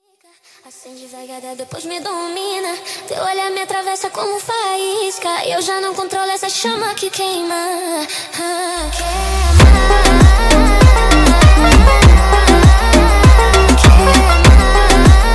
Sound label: Montagem sped Up